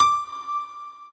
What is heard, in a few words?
piano